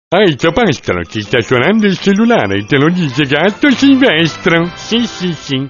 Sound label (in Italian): Suonerie Cellulare; Gatto Silvestro